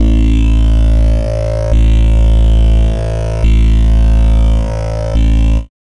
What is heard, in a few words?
Bassline, Dubstep; GhostHack